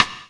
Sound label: Stick; Roland MV8800; SP-8800